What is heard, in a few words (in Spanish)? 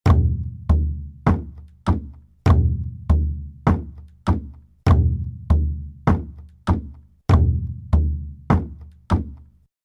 Ritmo passo